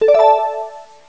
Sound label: scan-success